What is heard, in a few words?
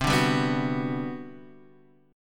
G Major 7th, C; strummed; JGuitar